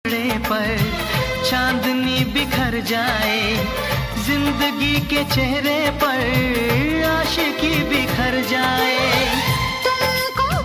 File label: love music ringtone, bollywood ringtones